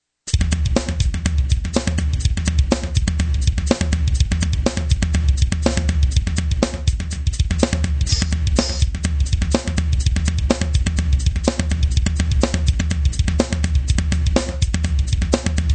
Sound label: takecover, drums